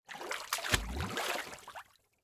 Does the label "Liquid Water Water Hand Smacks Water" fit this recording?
Yes